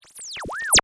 Tuner Oscillation, Tuner, Oscillation, Zap, Production Element, Imaging Element, Accent, Transition; Miscellaneous Electronic; BlastWave